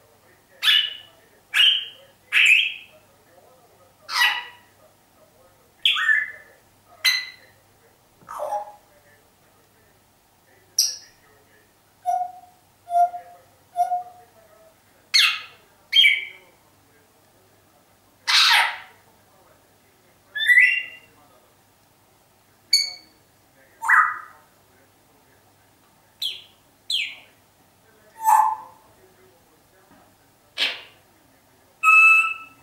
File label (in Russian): zhako, zvuk popugaya zhako